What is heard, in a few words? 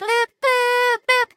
Drown; Teto; SM64 CO-OP; Triple Baka Pack